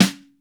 MIX SN, DRY STANDARD SNARE

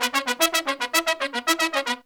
E, Pop & Funk Brass; Best Service ProSamples vol.25; AKAI

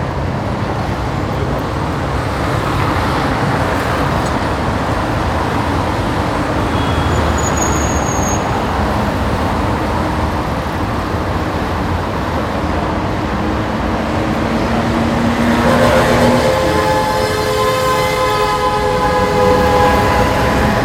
city ambience; E-MU Producer Series Vol. 3 – Hollywood Sound Effects; Ambient Sounds

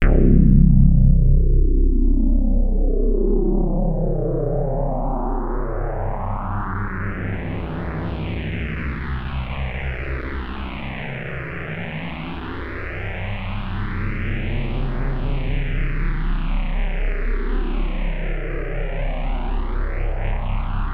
Access Virus B; Synthworx

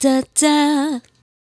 Dah Da 152 D, Mellow Women

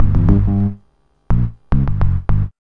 B-LOOP; X-Static Goldmine 1

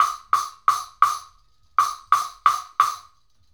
Wood Block; Sonic Foundry (Sony Creative Software) - Essential Percussion

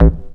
techno, BASS; Techno Trance Essentials

